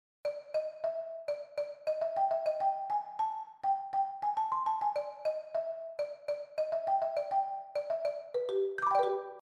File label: Marimba